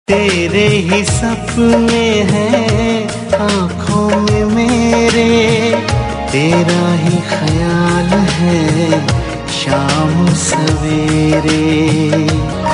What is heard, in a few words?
Duet Version